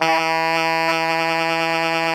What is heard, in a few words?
ALTO GR E 2, Saxophones; Greytsounds; Philip Wolfe David Crozier's Saxophones